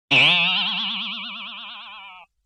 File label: Boing Box; Vintage Cartoon; Hits & Tones